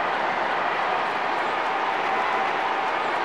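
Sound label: Basketball Crowd Ambience Loop; Crowds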